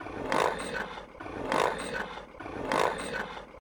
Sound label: Rotation, Metal, Crank, Woody, Grind, Serrated, Loop, Short; Hzandbits; Elegant Machines